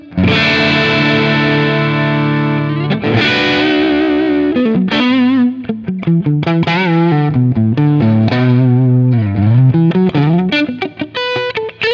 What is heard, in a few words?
Paul Reed Smith Neck PU Humbucker Split Breakup; Thomann 70th